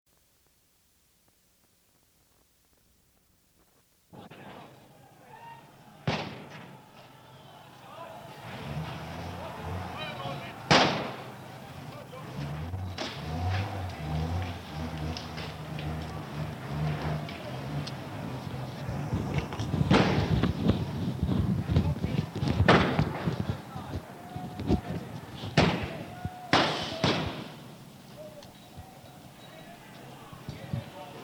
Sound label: shooting